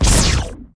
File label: blazefang death